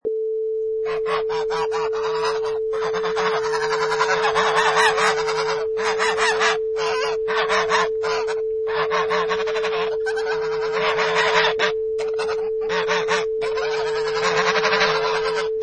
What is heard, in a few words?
Gaggle of Geese